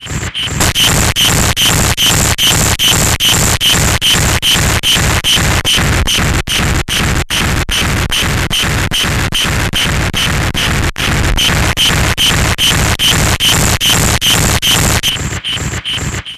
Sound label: dist before, effect pedals; audible Disease Convulsion CN-1